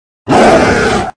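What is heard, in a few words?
werewolf skill A1; langren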